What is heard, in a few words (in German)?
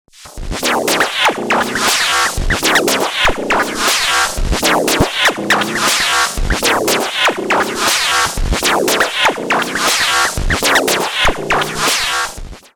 Erica Synths Perkons HD 01 Wild Noise Beat ohne Kompressor